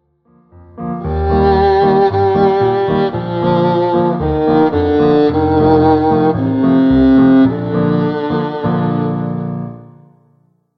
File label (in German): c saiten bossa nova hoerbeispiel